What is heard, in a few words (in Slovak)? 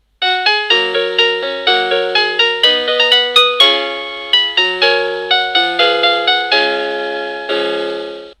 Bezdrôtový zvonček melódia